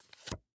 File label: draw, cards